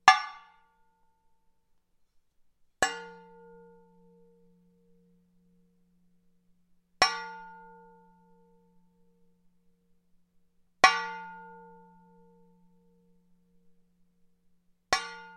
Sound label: impacts metal heavy large pole multiple